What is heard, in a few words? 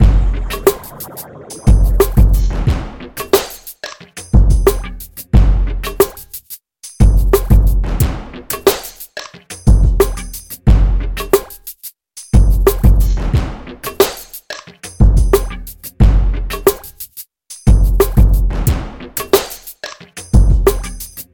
Grimey Drumloop